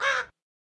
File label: AMB China 3D Amb